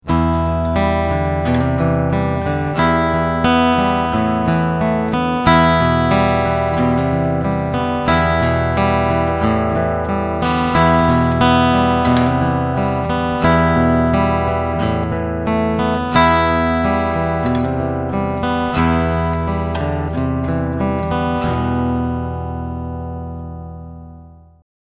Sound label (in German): Picking mit Cool Tube